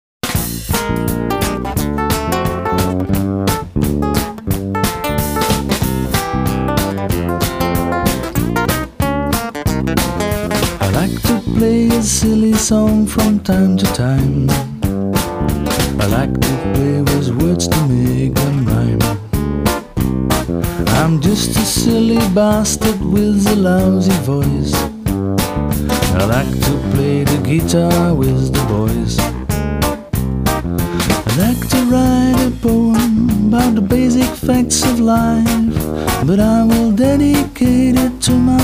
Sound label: silly song